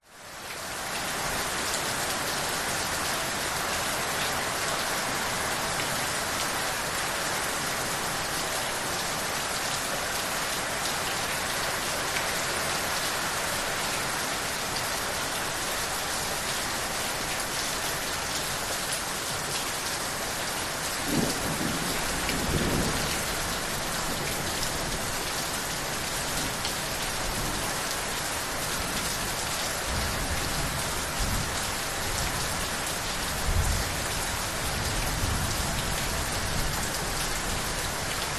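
nature rain heavy drips thunder rumbles exterior side house drips; zapsplat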